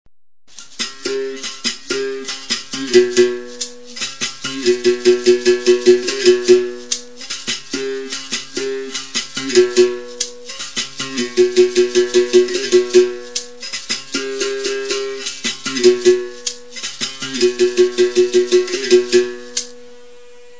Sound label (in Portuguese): Toque de lamento